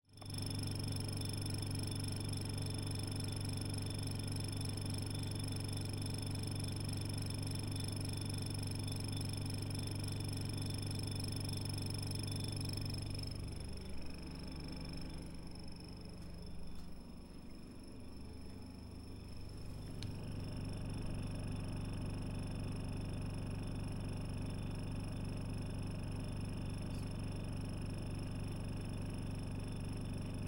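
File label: holodilnik rabotaet, refrigerator working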